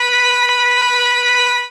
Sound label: org c#2, Pipe Organ, ORCHESTRON; Retro Sampler